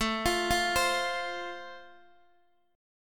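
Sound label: A Minor Sharp 5th, Guitar DADGAD, chord strum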